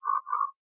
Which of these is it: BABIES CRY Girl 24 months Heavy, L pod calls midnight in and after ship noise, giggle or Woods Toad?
Woods Toad